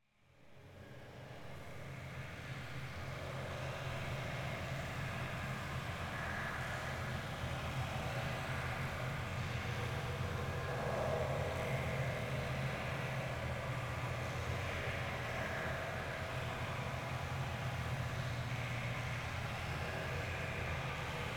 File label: Sewer Ambience - Streaming Water Or Underground Sewer Ambience; Hollywood Edge; sound designer's toolkit v2